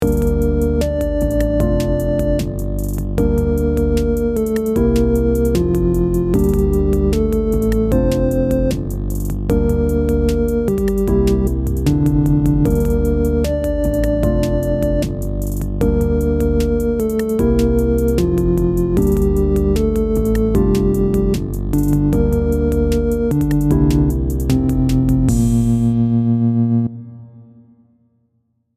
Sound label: game music loop